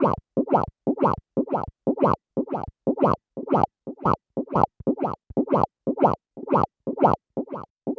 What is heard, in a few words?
Noise, LFO & Noise